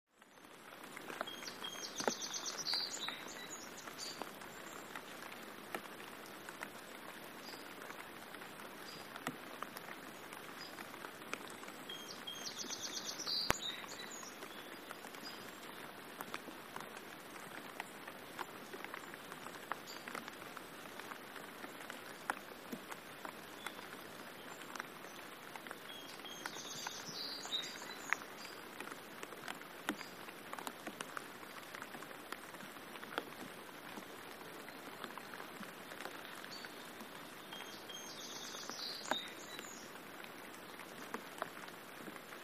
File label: Rain Light Rain, light